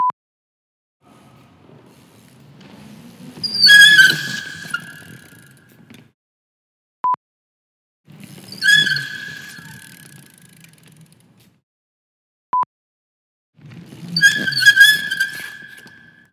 Indoor Brake Squeak

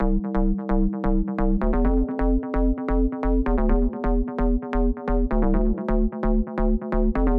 House Bass 128 Bpm

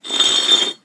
Brick Drag Concrete

